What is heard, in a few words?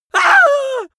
goofy male really afraid scream; soundpool